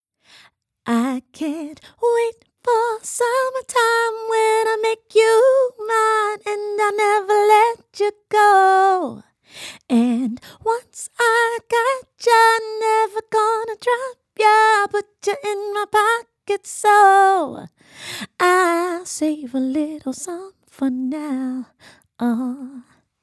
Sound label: female vocals; lewitt; lct-540s